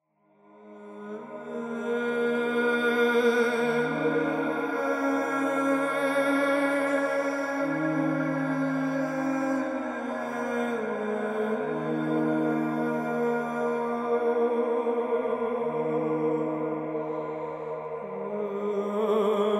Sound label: Healing Sonic Journey Vocalive; AudioSoul